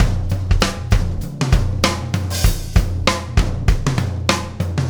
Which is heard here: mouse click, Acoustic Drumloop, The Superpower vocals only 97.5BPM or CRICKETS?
Acoustic Drumloop